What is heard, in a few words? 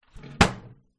household bread maker lid close